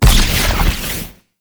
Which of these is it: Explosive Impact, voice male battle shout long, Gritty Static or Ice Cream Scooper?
Explosive Impact